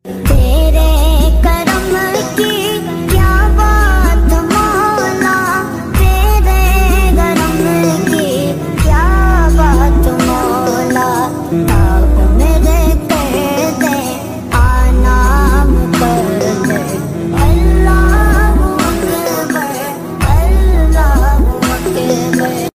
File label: Jumma Mubarak Naat Ringtone